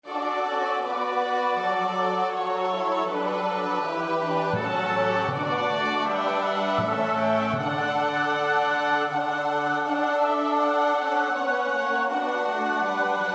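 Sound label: soprano alto tenor bass; Bach (Johann Sebastiaan); BWV 029; 7 Sei Lob und Preis mit Ehren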